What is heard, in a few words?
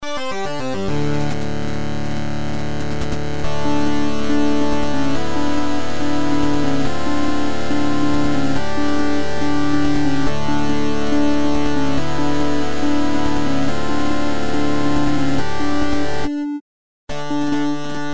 8 bit mix